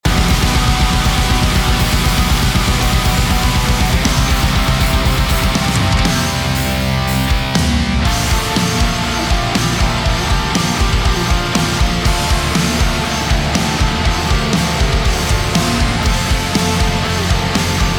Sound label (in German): Metal Gitarren